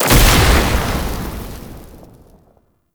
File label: Grenade Explosion Fire; Warframe; Entrati; Alchemy